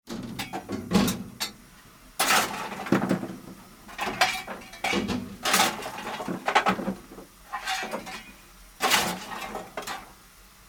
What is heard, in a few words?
shovel coal